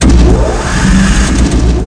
GEAR; PC Gamer IT CD 47; USAF; USAFDEMO

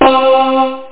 SLAP; Midistation; Bass

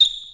squeek; CD Action; nbajam

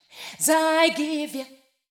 VOICE GRL HOUSE 125 A, House Voice

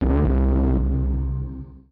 BASS SHOT HOUSE 125 A; eJay; Singleshots

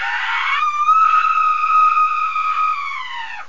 screaming (female)

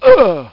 punch

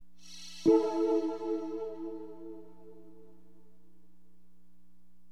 trig pad; HipHop Keyboards; LiveAct Pool 1

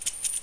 coins jingling; Nethergate

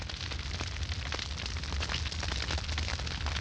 fire torch; Hellboy

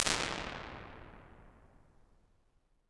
church; quantec

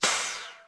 popup

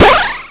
Real Neat Boing; Lunar Craters 1.1

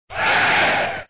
menu start; BecheruvSen

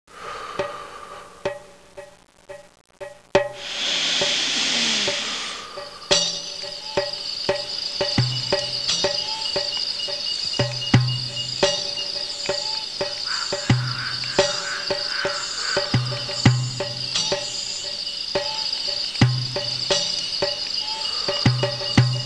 insect; Innerspace

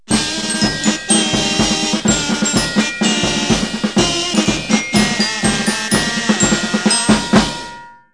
Kazoo Marching Band; Comedy Accents; KABOOM! - Wild and Wacky Sound Software; Music

